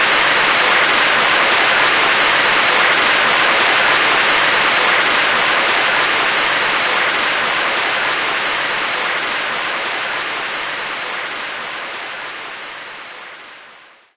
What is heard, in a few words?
Storm; Ready Set Go Sprout!